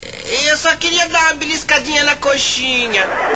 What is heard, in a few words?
knife